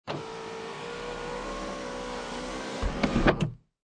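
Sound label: Car Interior Sunroof Close Automatic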